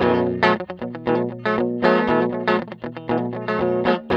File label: TELE 7TH C 3, guitar; Spectrasonics; Hans Zimmer Guitars VOL-1; TELE7THS